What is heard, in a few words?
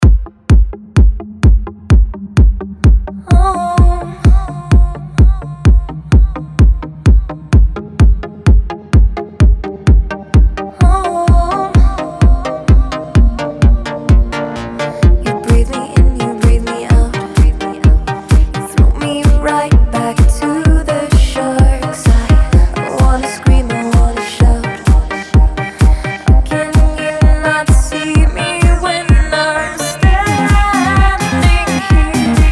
Home C Minor